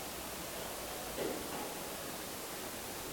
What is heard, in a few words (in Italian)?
Rumore in stanza; Castello Marostica